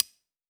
Percussion, Hip Hop, Drum One Shots

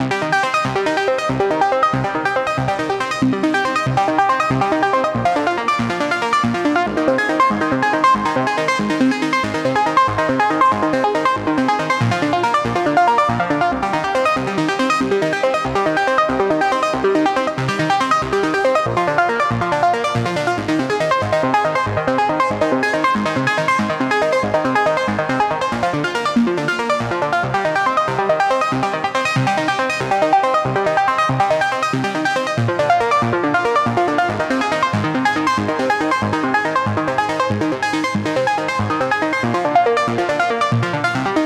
140 BPM C Min Arp, Synth; Melody Loops; Synth Collection; Commander; Cymatics; ANALOG EVOLUTION Beta

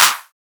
Clap, Techno; Techno Sounds 2024

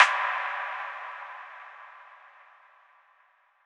Rim Impact; trap; FX; Cymatics; Trap Starter Pack

